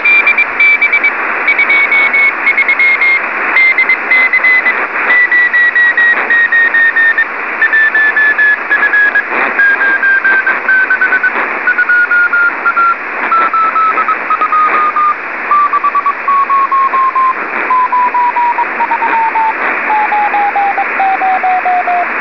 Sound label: doppler